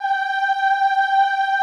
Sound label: OOH G4, Classical Choir; Best Service; Extended Classical Choir; OOH MIX